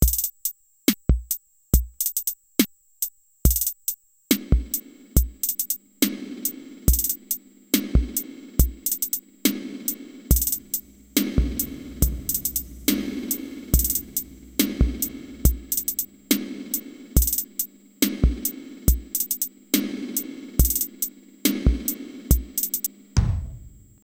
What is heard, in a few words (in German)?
Drums mit Effekten Reverb